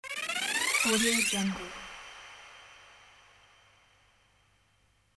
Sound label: movement